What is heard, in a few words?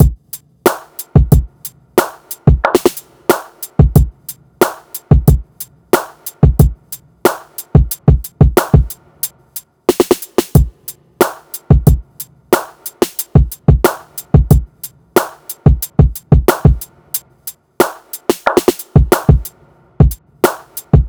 Drums, Cinematic Funk; Sonic Foundry (Sony Creative Software); Cinematic Funk Urban Tone Poems